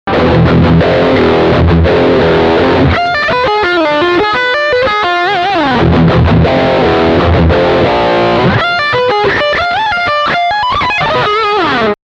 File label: Heavy Riff Humbucker